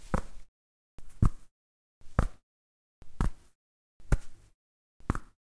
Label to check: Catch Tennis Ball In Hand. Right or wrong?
Right